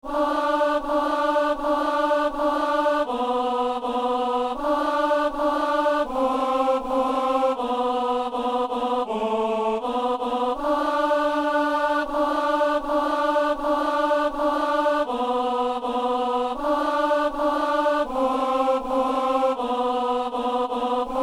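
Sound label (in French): ténor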